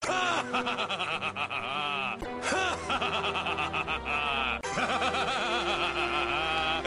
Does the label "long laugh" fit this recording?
Yes